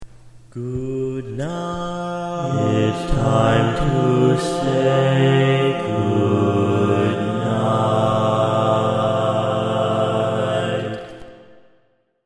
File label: Bass, Barbershop Tags